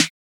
snare

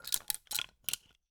construction tool

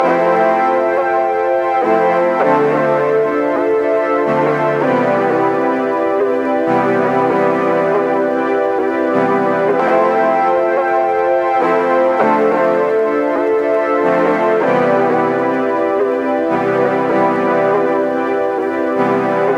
synth loop, C, 98 bpm

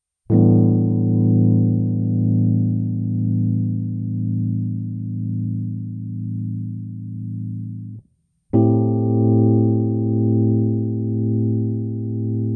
tremolo slow; superflydsp

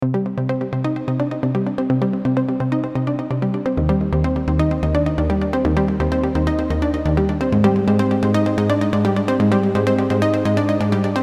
soft lead; ana modern

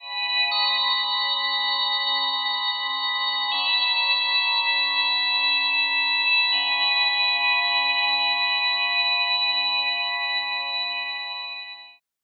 atmo oneiric chamber

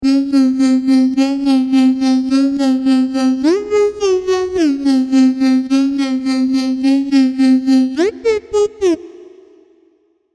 serum synths; chiqui audio

